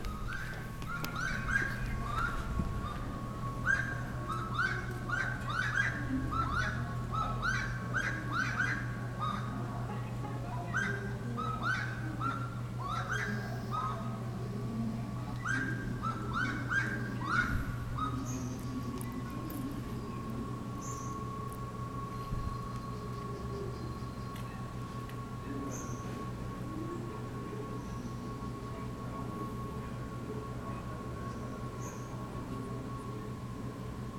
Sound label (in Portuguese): saracura do mato